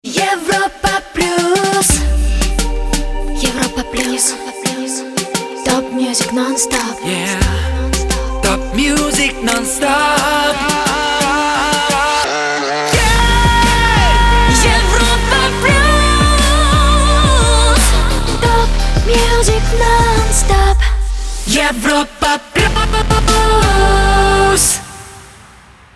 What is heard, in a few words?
Jingle Main ID; Europa Plus